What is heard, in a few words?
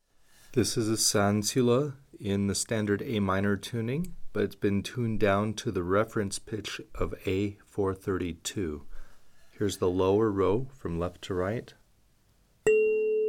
sansula A minor 432